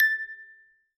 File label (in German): spieluhr; Terrarum